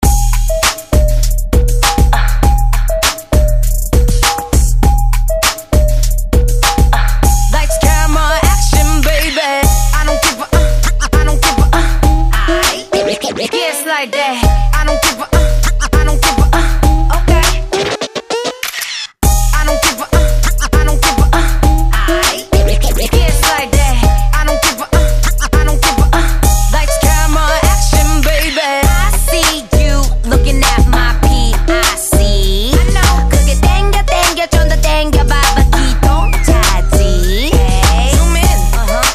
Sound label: mashup breaker hype 100 bpm dirty